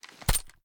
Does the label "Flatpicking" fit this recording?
No